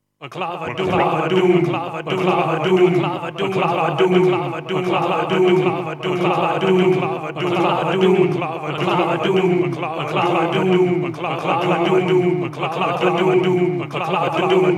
doom loop

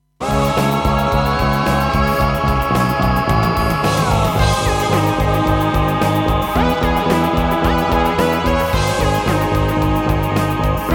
bass take

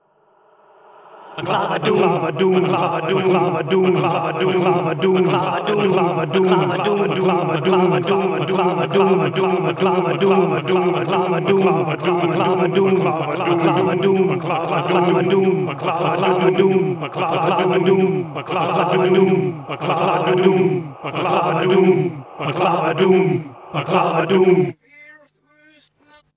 doom loop

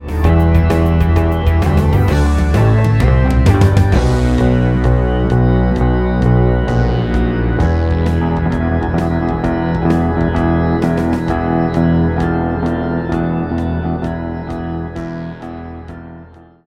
bass vibrato

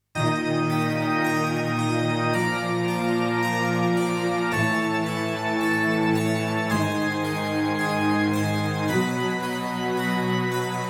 riff

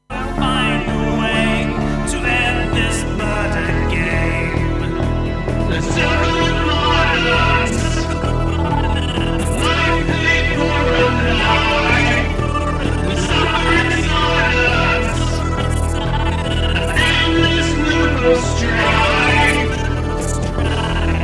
vocal test last verse

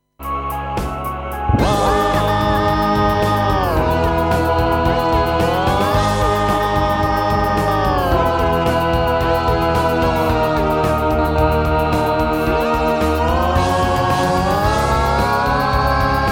radiophonic choir

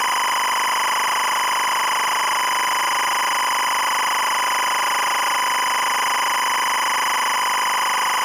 smpte

